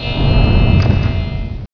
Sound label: eagle cry